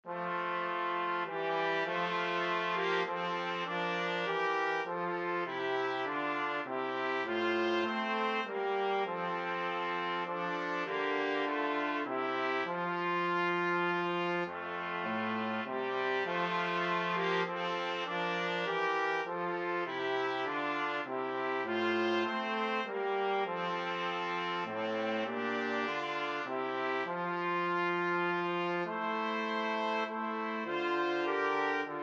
2 trumpets trombone

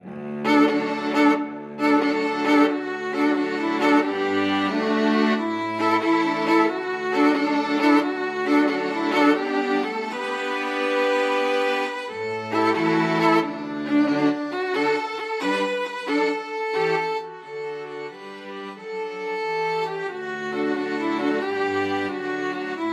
string quartet

